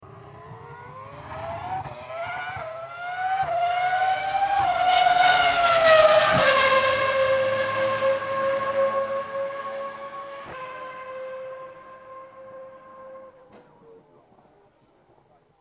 f1 sound passing by full acceleration, mercedes w02 f1 car upshifting fast and passing by; with full acceleration